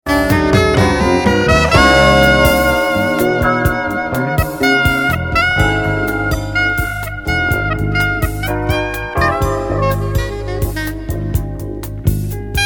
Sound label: soprano; woodwind